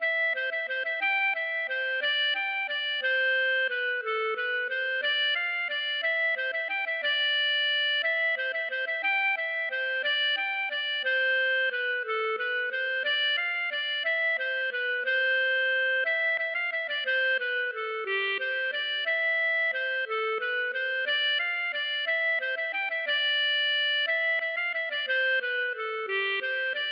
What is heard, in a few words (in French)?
valse